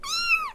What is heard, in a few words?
Meow; smbx38a